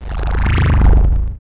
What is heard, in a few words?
Alien Sound 8 Bit